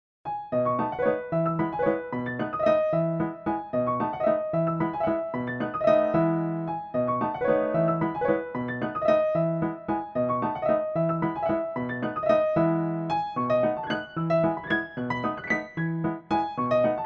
Piano Walzer 2te Variation

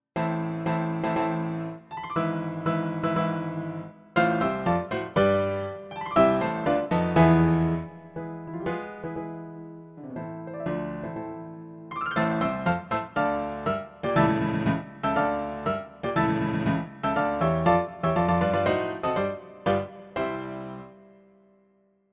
der kurze Marsch

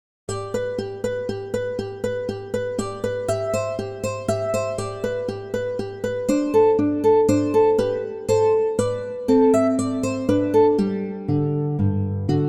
Gitarren Allegro

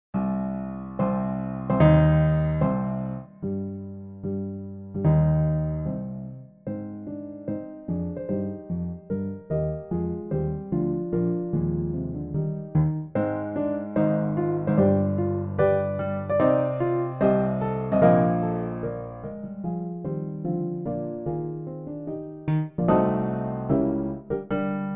Trauer Marsch; Light2Art

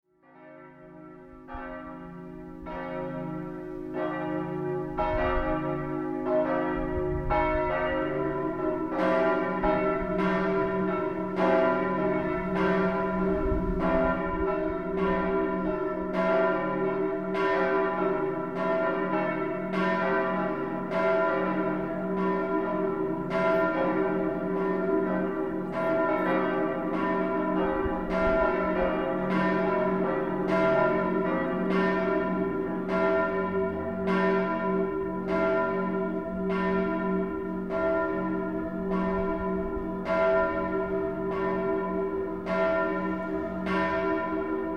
Mittagsgelaeut

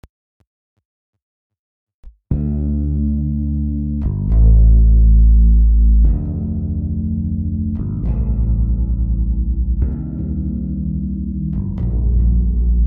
Bass zum Einschlafen; 38 secondes basse pourtant endormir

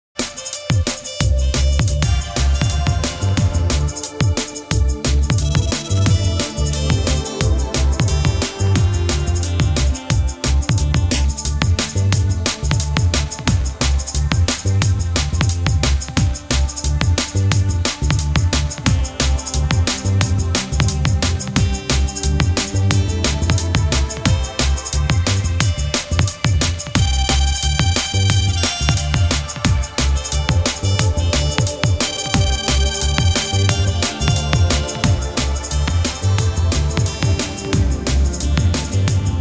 Fanfaren Jazz

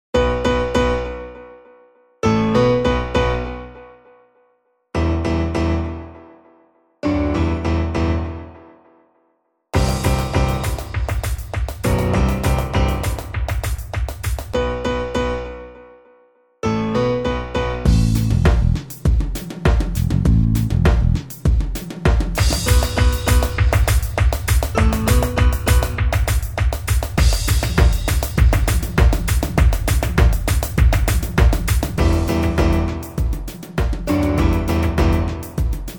Klavier Beats

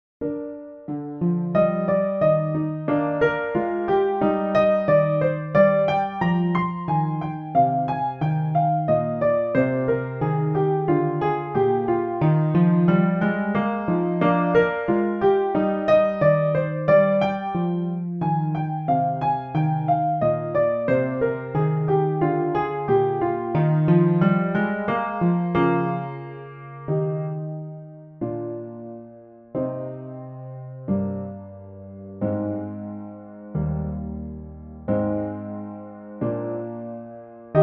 Piano Canon

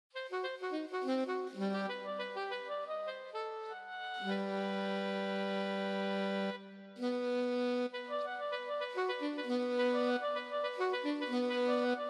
franzoesisches Akkordeon Solo

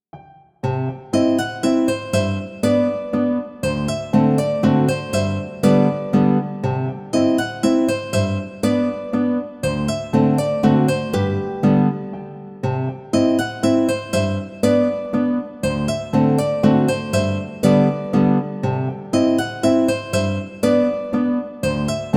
Klavier Gitarren Laendler

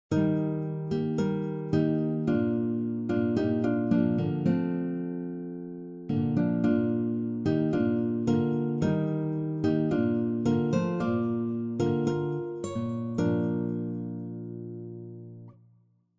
Gitarren Weise; la brève guitare manière

